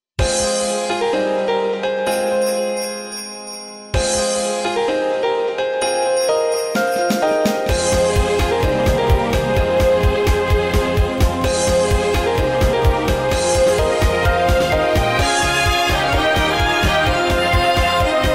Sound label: Battle Forest